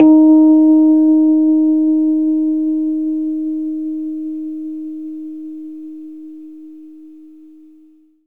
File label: Rhodes D#; 3D Sound; 3D Keys VOL-1